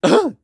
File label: Maggot Bounce